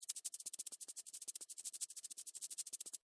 Beetle Run Sand, Beetle, Run, Sand, Scurry, Insect, Bug; Beetles; Sound Effects:Animals:Insects; Blastwave